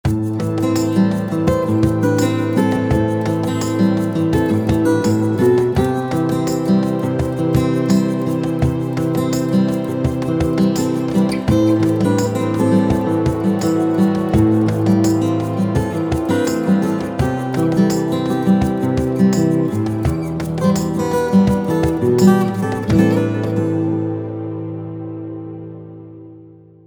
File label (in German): Gitarre Preamp